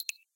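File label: button click; geometry tower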